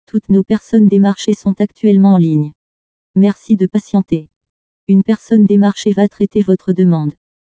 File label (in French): mise en attente